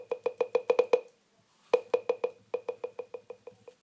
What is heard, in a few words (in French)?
touches volume; yosemite